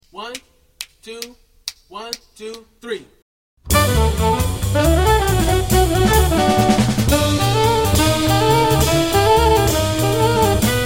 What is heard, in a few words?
minus Piano